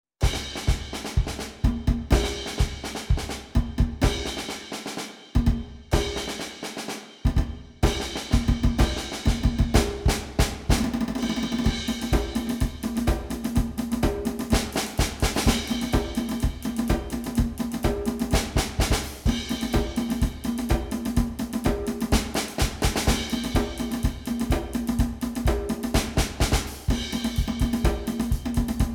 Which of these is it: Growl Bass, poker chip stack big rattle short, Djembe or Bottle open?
Djembe